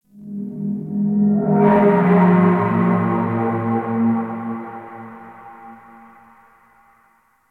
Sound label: Chamber Drone One Off; Orokin; Warframe; Sacrifice; Ambience